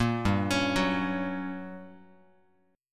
G Minor Major 7th Flat 5th, G, down, Guitar, 4A 4E 5A 5D 5G sharp 6C sharp; arpeggiated; JGuitar